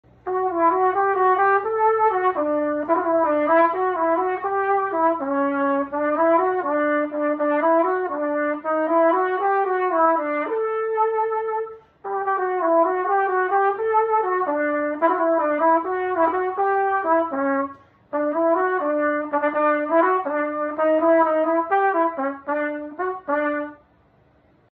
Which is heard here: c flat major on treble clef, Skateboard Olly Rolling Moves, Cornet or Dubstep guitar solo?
Cornet